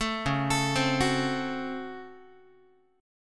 C sharp Major 7th Suspended 4th Sharp 5th, Guitar; C#M7sus4#5 arpeggiated; JGuitar

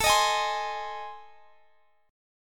A Minor Major 7th Flat 5th, Guitar Half Step Down

